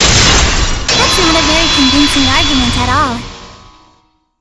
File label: cross swords; monaca